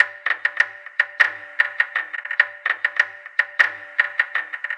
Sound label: Fidget Beat, Electronica, Solo Beats